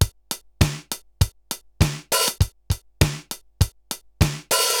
RX17 (100BPM), cyborg beats; musicradar

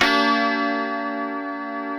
G#3 C funk chord, guitars; Spectrasonics; Hans Zimmer Guitars VOL-1; 90's Sample CDs

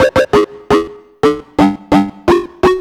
Lead Clanger, Drum N Bass; Keys; Pads; Sonic Foundry (Sony Creative Software); Knutrix Drum & Bass

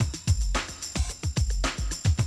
Classic DrumLoops, 110

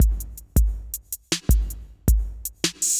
Synth Loop, BPM 80

